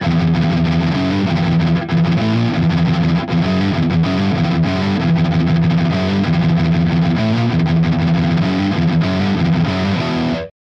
Rhytm, rebelliant neck

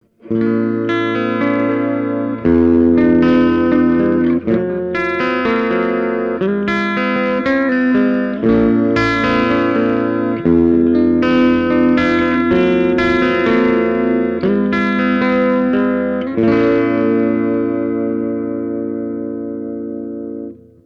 eclipse br neck clean; eclipse active; tech21

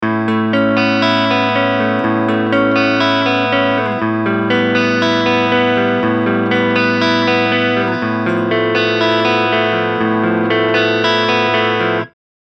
Neck Clean; eclipse active; line6

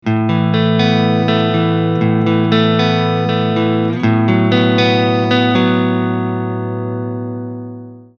NECK, eclipse active krank

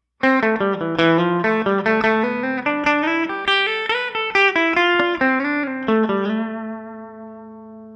Clean VOX AC30

